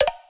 Collision; Pinguinos Biri Game; Android

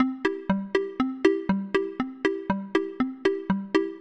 bgm ladder

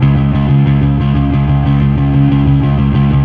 Fuzz C sharp 2

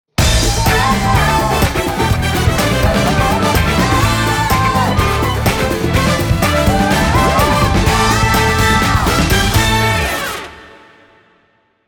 Perfect Victory; Festibattle

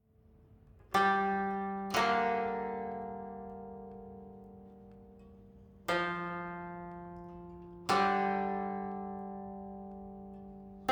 Savannah Music

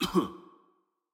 Prisoner Clear Throat

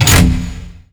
light panel lock

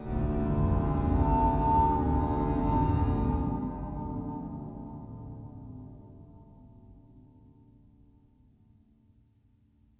atmo church organ outside; Haunted

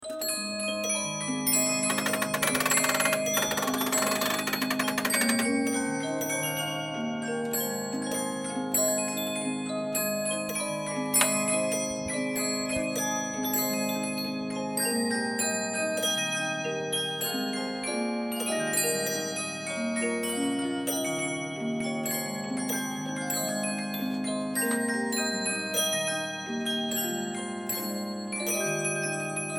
Circus Melody; Leave Me Alone

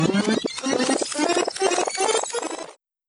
boot Sound; Replay VHS Is Not Dead

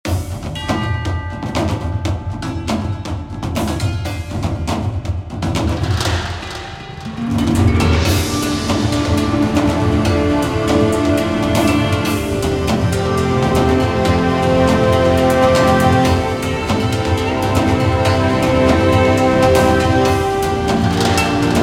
Battle; SuperMagical; iOS PS Vita Windows

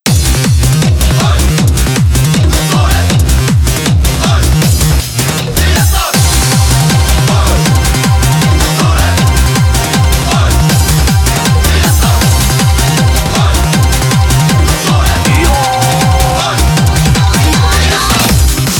matsuri FESTIVAL (instrumental); Reflec Beat Groovin Colette; FUJIMORI

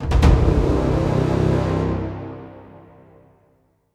Boss Announce; Nonstop Knight; Android

